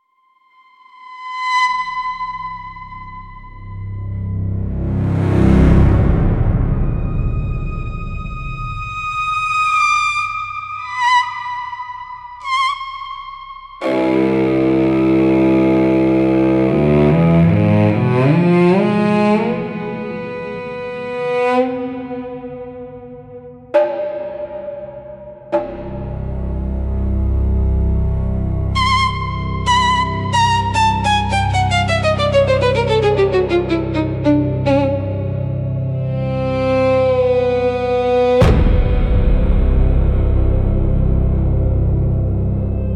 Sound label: White Hall Screams, spiritual spooky soundscapes